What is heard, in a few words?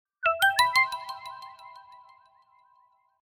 Message, notifications